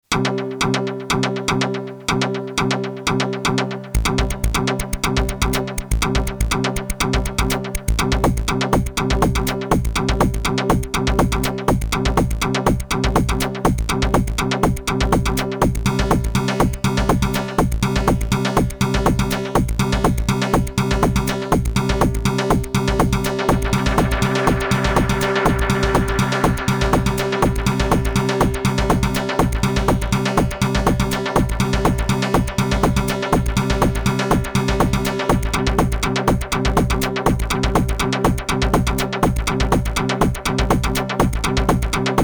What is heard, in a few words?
Routing Wiggle; Twisted Electrons Blast Beats